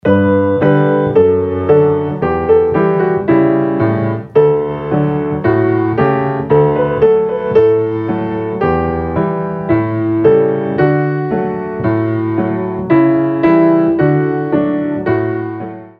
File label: Stride Jazz Piano; Kling Gloeckchen klingelingeling